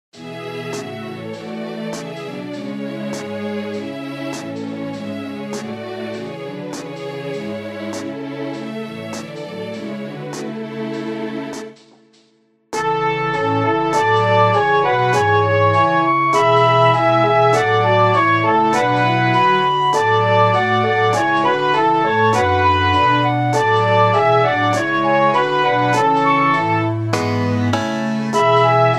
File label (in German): herr zeige du psalm25 chor